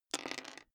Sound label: dice roll table clatter